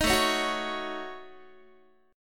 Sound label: A 6th Flat 5th A Guitar, chord; A6b5 strummed; 18,17,16,16,17,x